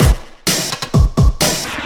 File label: Housebeats, 128BPM; Vengeance Samples; VEH2 Loops